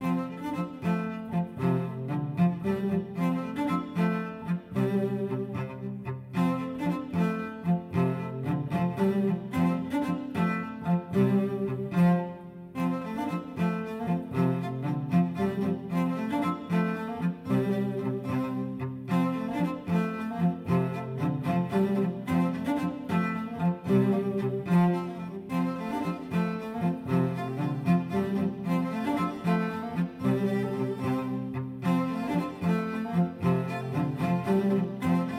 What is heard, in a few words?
Country Dance